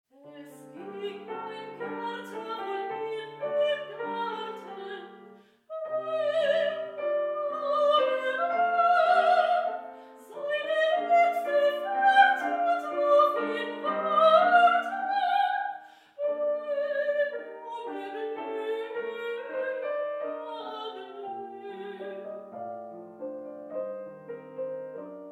Lieder; Gaertner

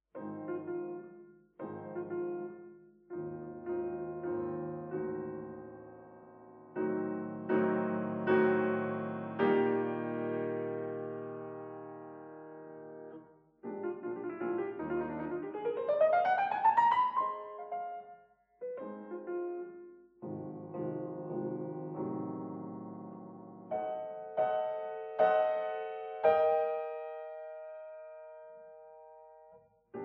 Sonate

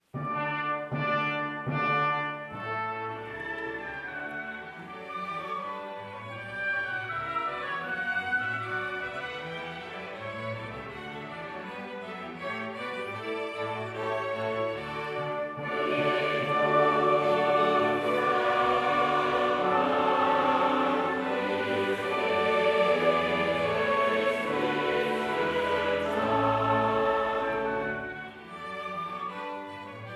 Choral; III Petri Bekenntnis

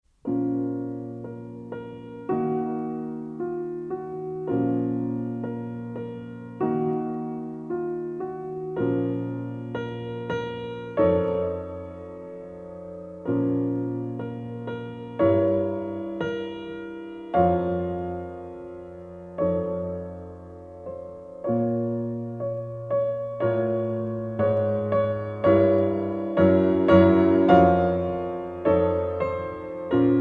Trauermarsch

